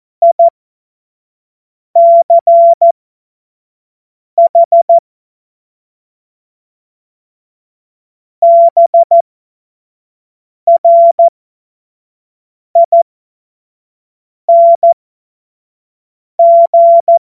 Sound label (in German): Witz, Morsekurs, 25 F70; Witze